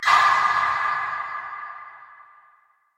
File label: skillcheck start